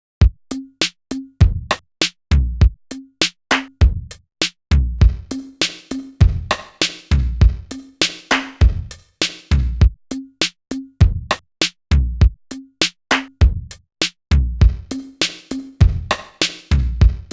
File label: Short Rooms